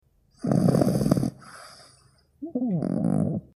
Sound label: snore; cutiepugs